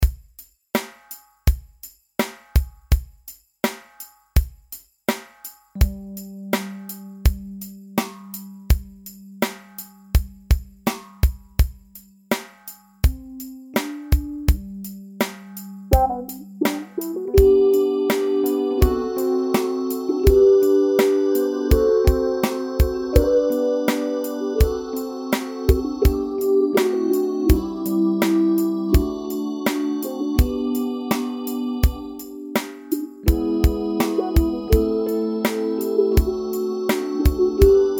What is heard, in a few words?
G maj etude without bass 83 BPM